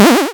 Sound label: Retro Jump